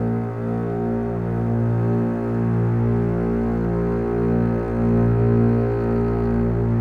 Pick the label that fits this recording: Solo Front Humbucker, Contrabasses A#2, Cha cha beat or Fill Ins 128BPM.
Contrabasses A#2